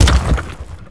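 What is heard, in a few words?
wheel cannon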